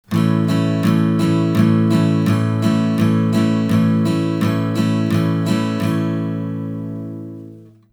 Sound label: strum; fretjam